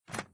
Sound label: Mech Monster Open; doodle jump 2; android